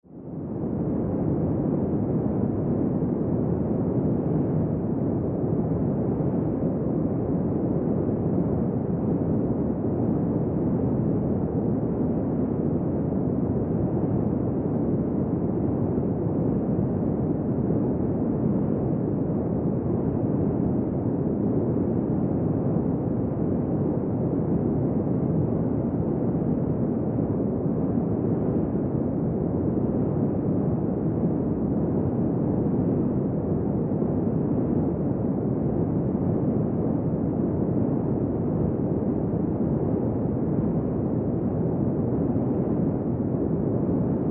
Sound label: Wind Drone Desert Steady Constant; Weather; Part of Forest; Blastwave